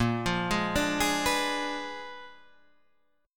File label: Bb 7th Flat 9th Bb, chord; Bb7b9; arpeggiated; JGuitar